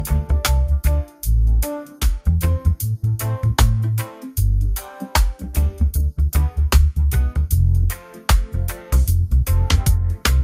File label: Instrumental